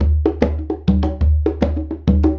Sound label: Congas; Essential Percussion